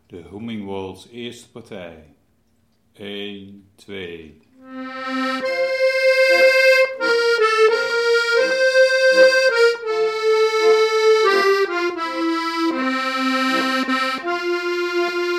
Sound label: waltz eerste partij; homing waltz